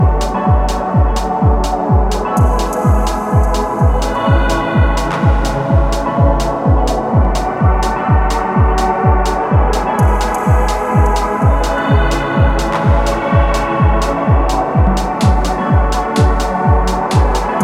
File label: Tromb Horn; Disco